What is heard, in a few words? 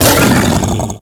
Kubrow Feral Vocal Grineer Finisher Tackle; Enemies; Warframe